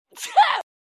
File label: Female Sneeze